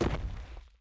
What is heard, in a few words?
FOOT